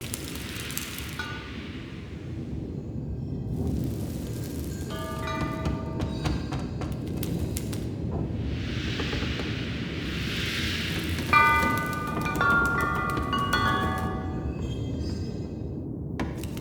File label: Hut Interior Loop; Ambience; Midrath Island; Grandfather